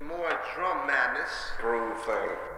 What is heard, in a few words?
ONE HAND, 99 bpm, Breaks; POTBreaks